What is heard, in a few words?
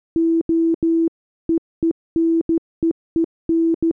Hardwear Off